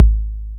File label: Boss DR-660 Kick, Drum Machines